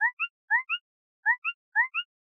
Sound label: Wall